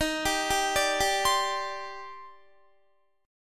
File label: D sharp Major 13th, down, Guitar; arpeggiated; JGuitar